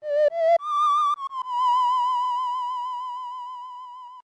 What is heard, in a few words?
Ocarina; Playing the Ocarina